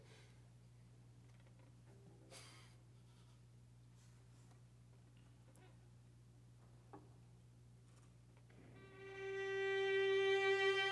Overhead

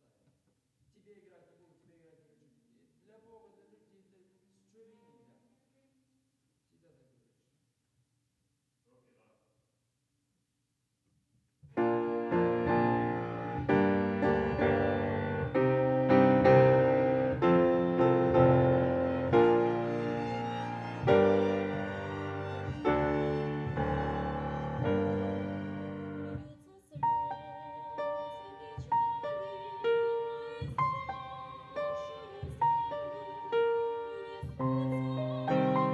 Piano

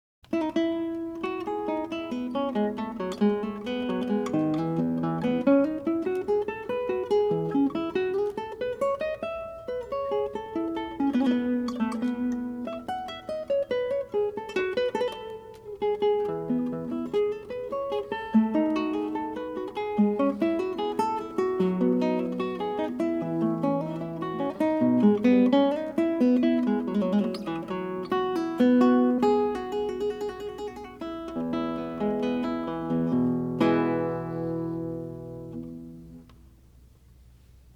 baroque guitar - prelude; purcell

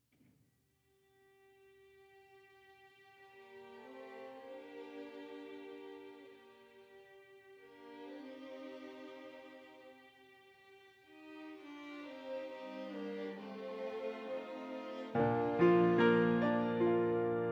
Piano

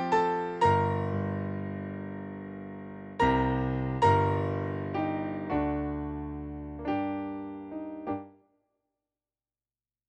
Piano

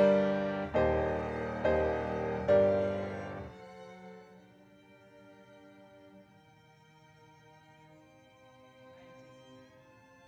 Piano

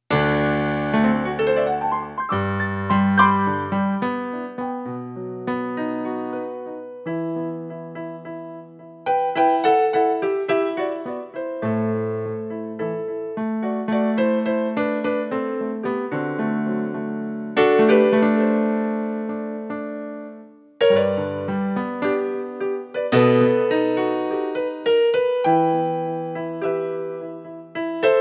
piano

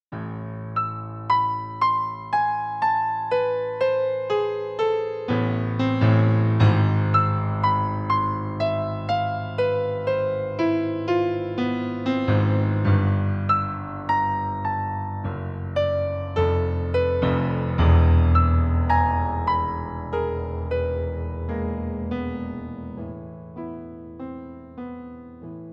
Piano

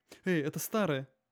alto

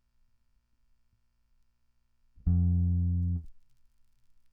bass

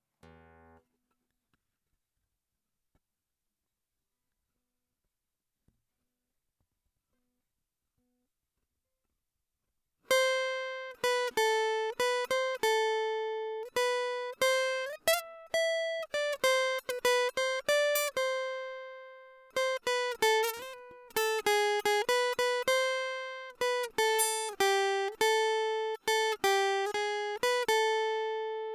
guitar nice

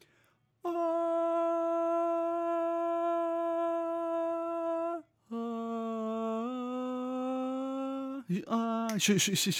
tenor

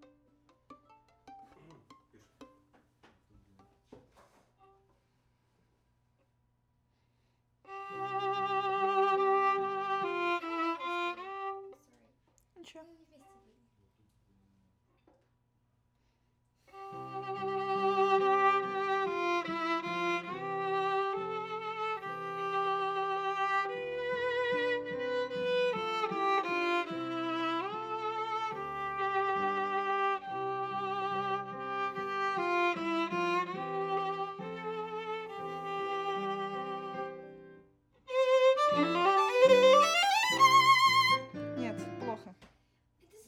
Viola C

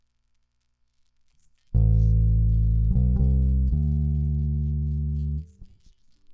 bass

